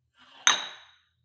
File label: weight rack insert weight hard, fitnessstudio